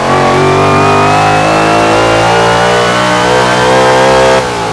third, vehicles; gmod; tdmcars

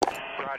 general up down movement, user interface; gmod; mw

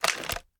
inspect xmag stock end; reloads; gmod

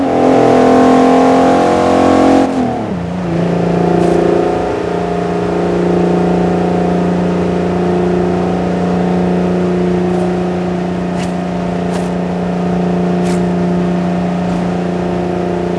fourth cruise, vehicles; gmod; tdmcars; eclipse gt

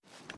reload empty fast arm; reloads; gmod

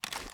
raise first start; reloads; iw8; uzulu; gmod

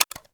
ar reload dmr mag out; weapons; gmod; viper; scharlie

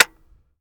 disconnector; viper

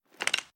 reload empty end; reloads; gmod

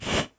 gas buff; gmod; zerolib

